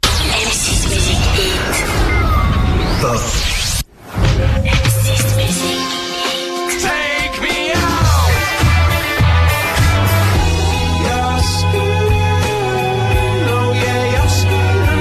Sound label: pop